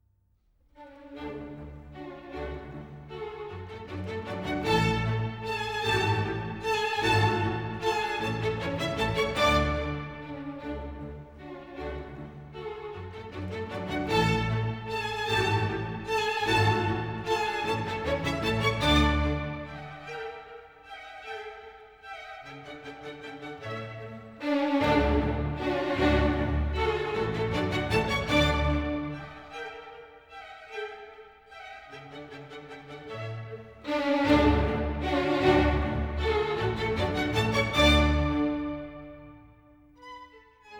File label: German Dances for string quartet